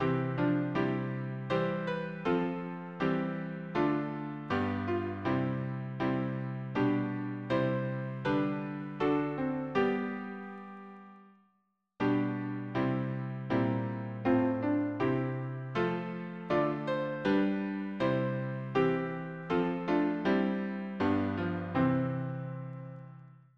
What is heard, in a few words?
harmony, piano